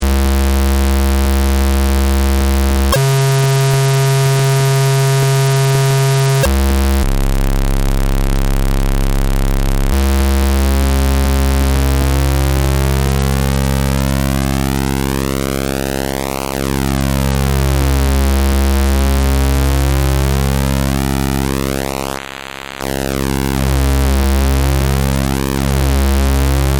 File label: a110 pulse; A-110 Standard VCO; 矩形波&パルス・ウィズ・モジュレーション; DOEPFER A-110